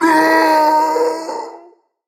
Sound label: Falling; Lazarus